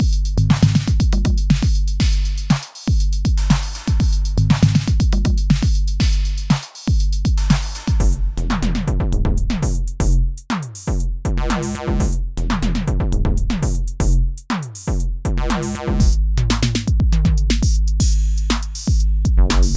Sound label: Kits